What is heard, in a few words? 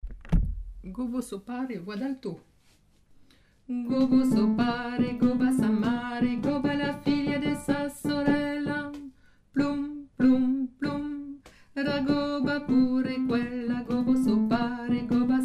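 soprano alto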